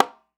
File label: Conga Sp; Roland SPD-S